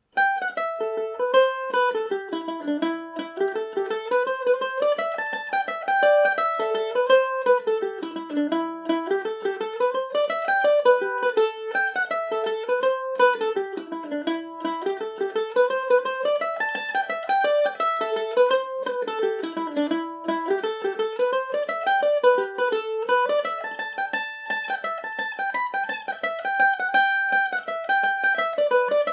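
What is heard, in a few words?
A dorian, Irish mandolin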